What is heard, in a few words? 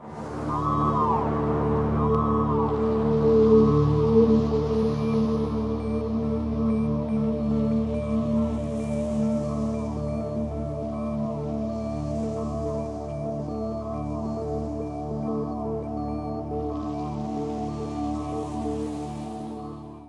ON BEACH, AMBIENT PAD